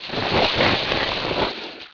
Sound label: sneaker brake, skater; Tony Hawk's Project 8; XBOX